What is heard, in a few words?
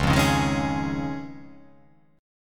C sharp Major Add 9th